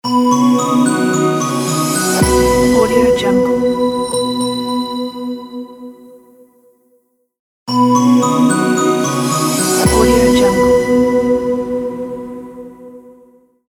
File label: clear logo